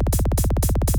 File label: Drum